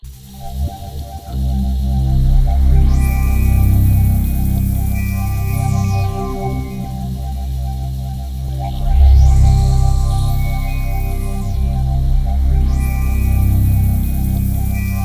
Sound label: SynthLine C1; 90 s Sample CDs; NiceBeats; SynthLine VOL-15